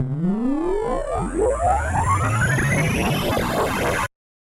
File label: Laser Charge Up; SoundsCrate